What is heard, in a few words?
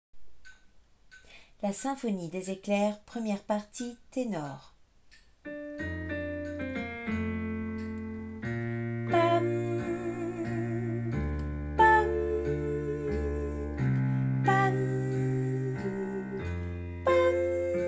tenor